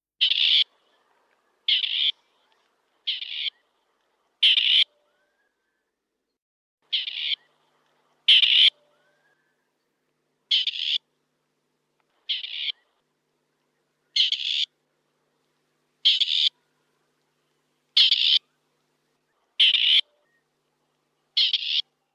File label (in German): Rebhuhn, Vogelstimmen